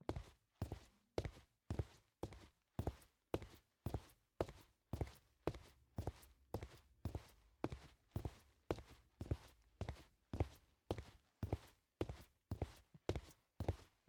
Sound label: Footsteps Boots; LOOPERS